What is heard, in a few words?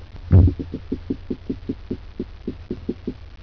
Ophiderma, treehoppers; Cocroft